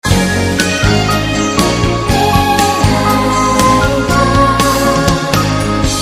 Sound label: Loop